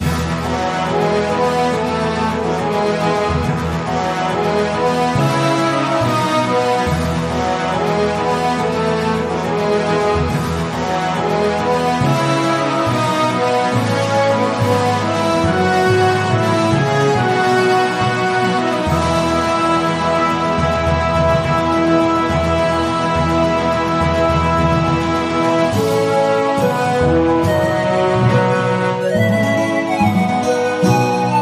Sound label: Base Music; SoundTracks; BattleBuddies